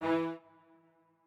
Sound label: strings